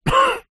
human male cough soft wheeze; zapsplat

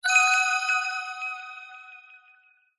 fantasy magic ping wand 90s style dreamy

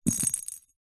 plastic cat balls bells x2 drop carpet

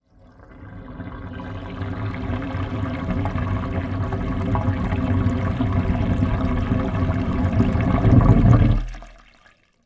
drain floor gurgle nearby sink drain

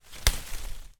pick apple from tree; foley

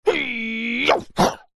human male fight vocalisation karate hey ya; zapsplat

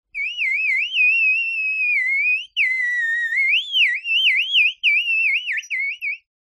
bird flute

